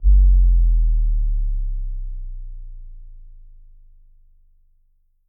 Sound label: Bass Drop Sweetener; The Town; Intro Sequence